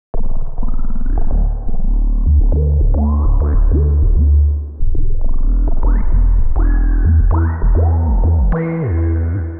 100 F Water funk, Bass Loops; Touch Loops; BlissedOutBeats

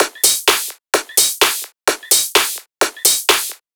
perc 128, Electro percussion loops; Anthemic

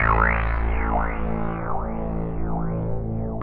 TEKNO 140, Techno; Techno2; Sphere

